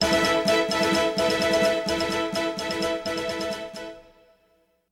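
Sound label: News Flash instrumental; camp musicfully 2025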